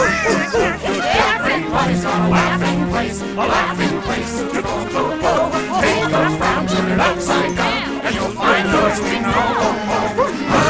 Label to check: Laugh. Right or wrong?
Right